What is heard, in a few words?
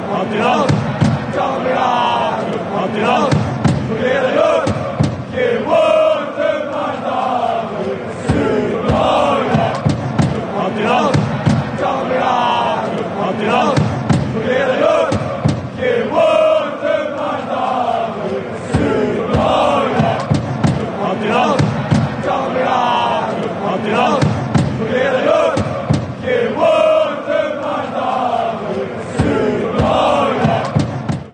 hand in hand kameraden, fanchants